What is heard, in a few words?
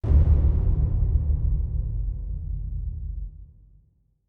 perc spot